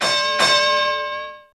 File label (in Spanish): Pitido Final; El Chavo: Una Feria en la Vecindad